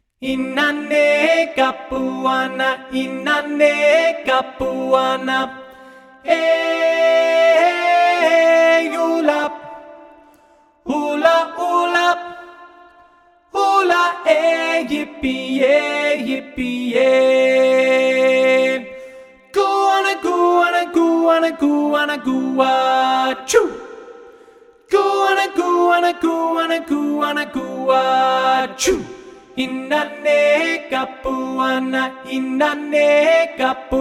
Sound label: inanay (alto)